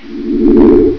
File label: teleport; Quake; PainKeep